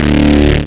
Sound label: growl; bot; cooked